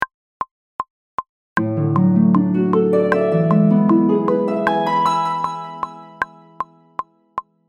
Harp

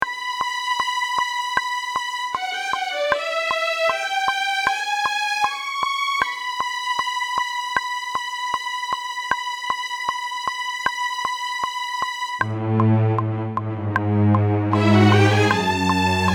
Strings

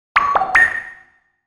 Perc

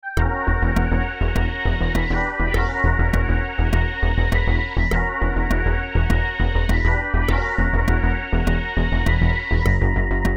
pad

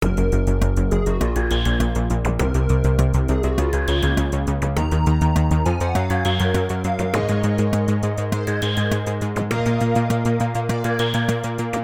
B C melo

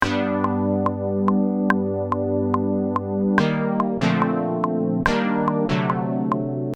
Chord

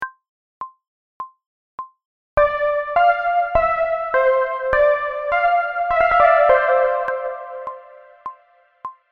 A melo

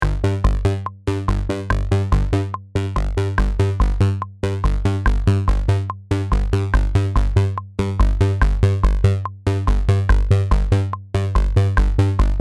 Bass

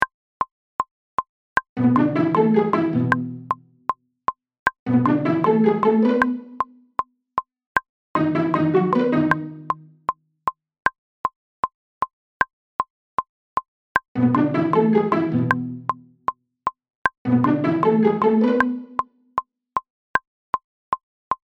St Pic

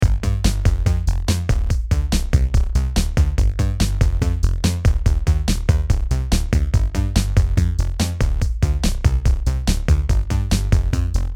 Drum Bass

